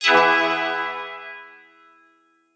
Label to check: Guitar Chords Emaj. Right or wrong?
Right